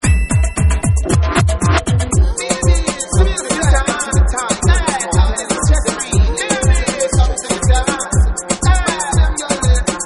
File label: Reggae Medley Mix